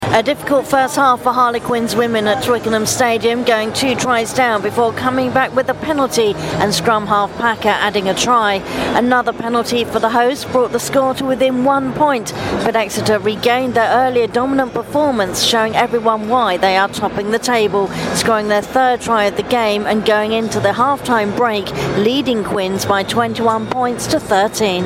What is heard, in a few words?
half time at twickenham stadium rugby; harlequins